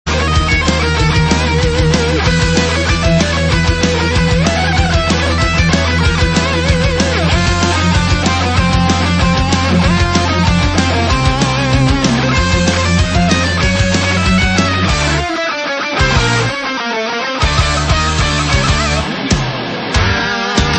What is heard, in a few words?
rock metal cover; Monty on the Run